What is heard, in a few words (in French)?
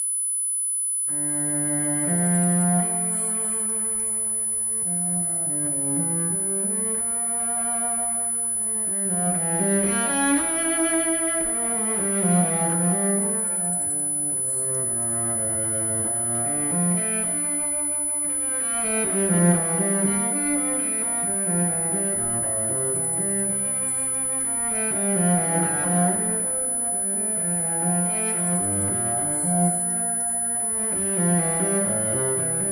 suite violoncelle extrait